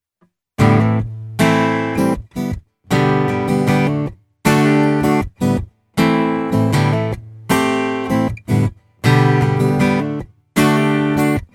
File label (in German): gitarre